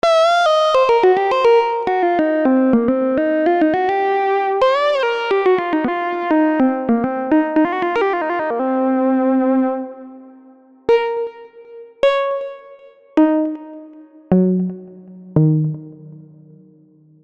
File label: lead; XILS